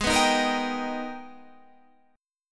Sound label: G sharp Minor Major 9th, Guitar, Standard; strummed; JGuitar